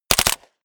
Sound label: smg uzi 45 very close